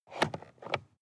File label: sports car int compartment close; verkehr transport; autos